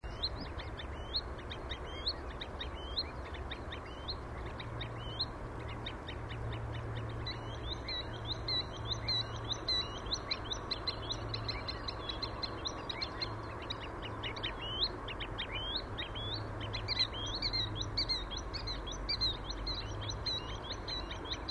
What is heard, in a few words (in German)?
Waldwasserlaeufer, Plattling Klaerteiche; Susanne Rieck